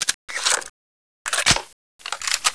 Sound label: ak74 reload